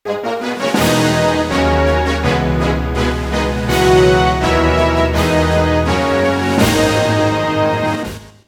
Race Finish; Sonic R Proto

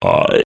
frog croak

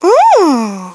Peach Ooh; Brawl

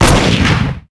shot cycler shoot; Daikatana

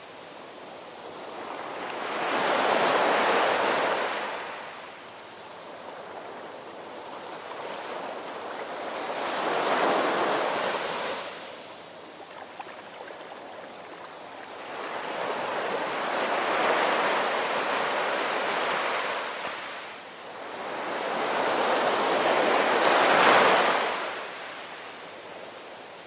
ocean loop sound; Rust legacy

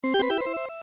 Driver change; MKS Proto

